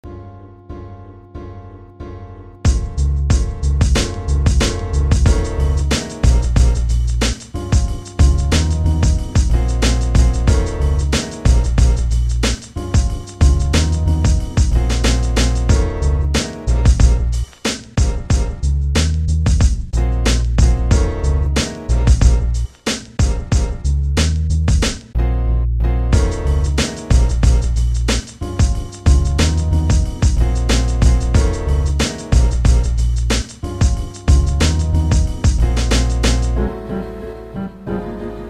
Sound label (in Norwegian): Ny piano